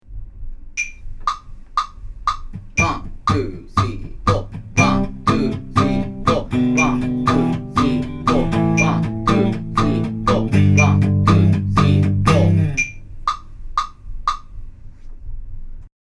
vocal

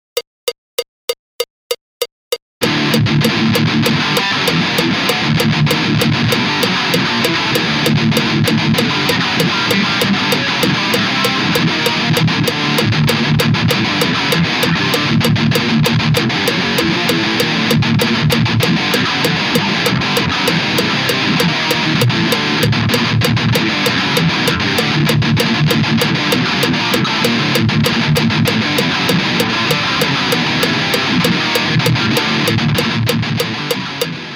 thrash

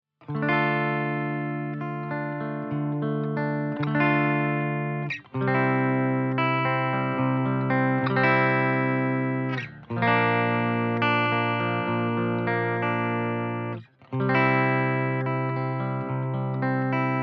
Metal

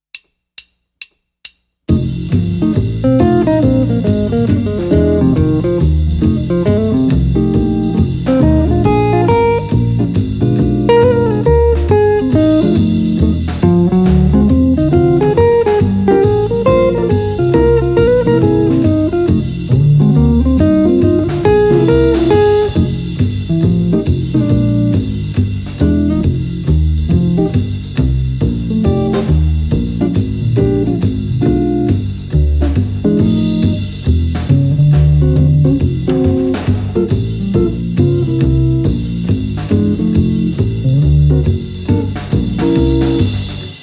Jazz guitar

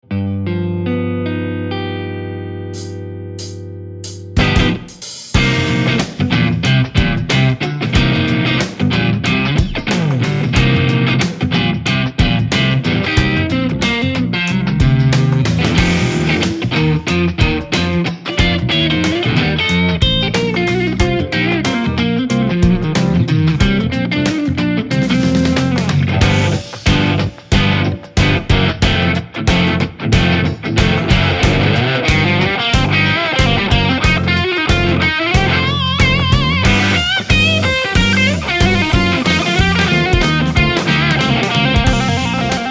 FENDER Custom Shop 51 Nocaster Tele Pickups

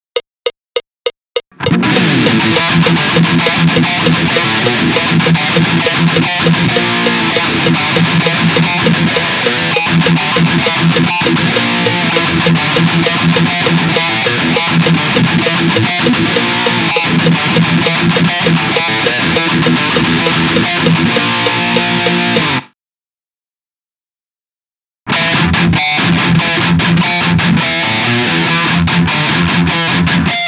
thrash

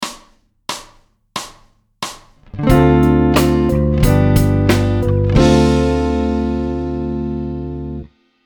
chord

